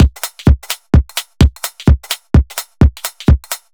Beat, 128bpm, uk garage

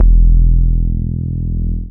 BAS MINI, Mini-Moog

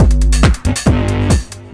drum 138, Techno, drum-loops

